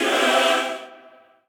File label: choir